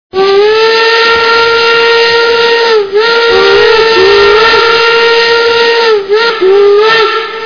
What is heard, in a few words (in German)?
pfeife